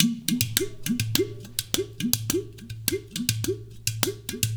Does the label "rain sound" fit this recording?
No